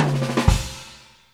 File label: FILL; PlatinumPhattCD1; E-MU Producer Series Vol. 8 – Platinum Phatt; Grooves Filz Hitz